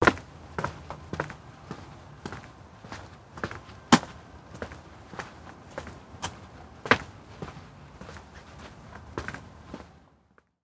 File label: walking; kill noise use expander